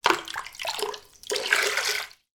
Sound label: household plastic cup scoop water from sink and pour out